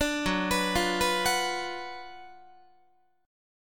F sharp Major 7th Suspended 4th Sharp 5th, F sharp, down, Guitar; F#M7sus4#5 Chord; arpeggiated; 17,14,14,15,15,17